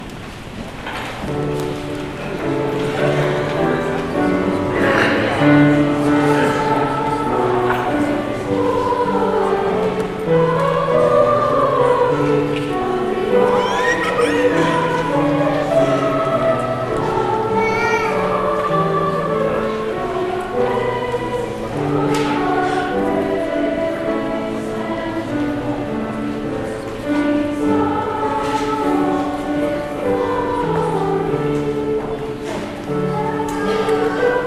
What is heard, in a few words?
Choral